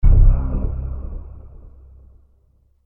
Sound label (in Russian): orkestr mrachnij akkord